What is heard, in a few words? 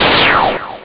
shot; Amiga Soundtracker Sample Packs